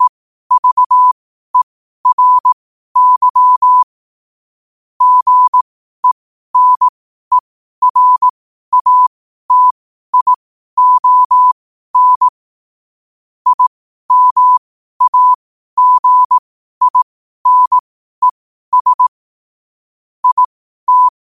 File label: 5 wpm, morse